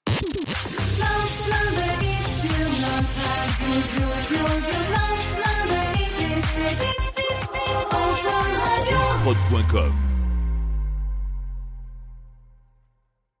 Radio Jingle Chante 122 Bpm; Opon

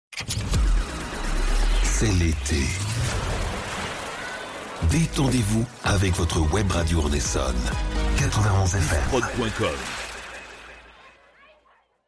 Jingle Ete

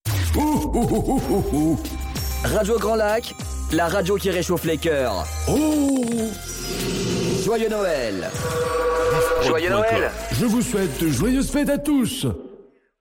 Radio Jingle Noel; Radio Grand Lac

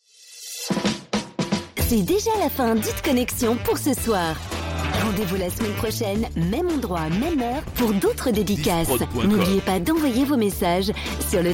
Jingle Outro Dedicaces; Hit Sensation 85

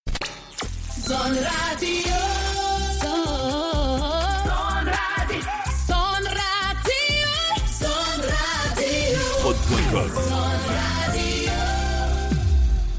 Radio Jingle Chante; Zone Radio

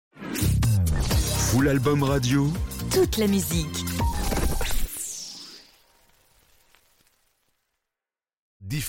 Radio Sweep Toute la musique

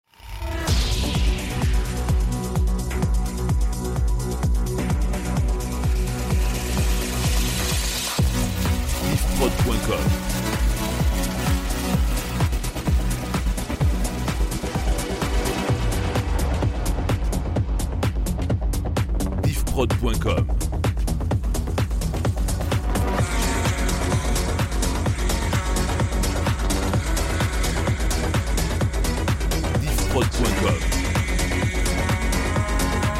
Medley Techno 128 bpm

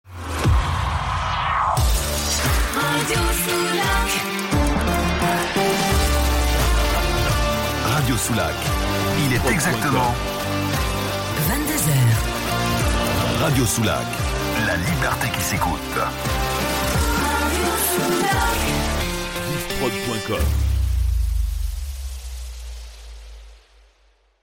Top Chante; Radio Soulac

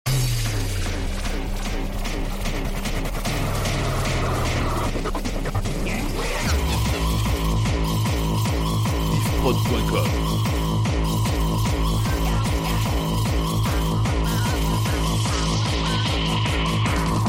Medley Hard Techno

